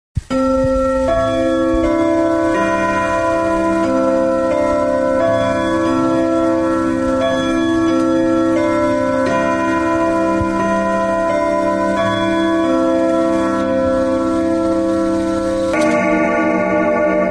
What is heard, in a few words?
Westminster Chimes, Grandfather Clock; Munroe